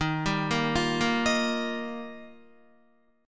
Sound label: Eb Major Add 9th, Guitar; Ebadd9 arpeggiated; 10,10,10,10,10,11; JGuitar